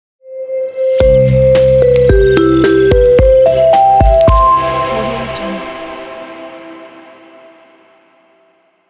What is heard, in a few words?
hitech logo; musicante